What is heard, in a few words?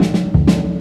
Roll, Drum Rolls; Zero-G Jungle Warfare 1, 2 & 3; 90 s Sample CDs